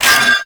Bird Three Scratch; Ambience; Warframe; Entrati

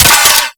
Hand Rocket Launcher Mech; Warframe; Corpus

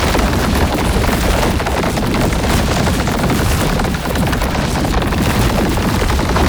Rupture Travel Loop; Roathe; Warframe; Tau; Abilities

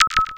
Objective Beeps

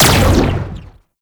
gunbrella shot laser; Weapons; Warframe; Tenno; Koumei

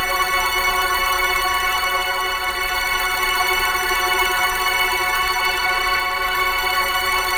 Hack Minigame Node Held Loop; Duviri; Warframe

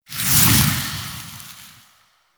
Wisp Buff Fire; Warframe

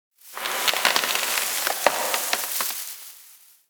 Exterior Tree Wood Creaks Angry; Warframe; Duviri; Ambience

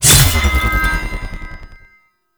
Plunge Ability Cast; Enemies; Abilities; Warframe; Duviri; Dax Knight

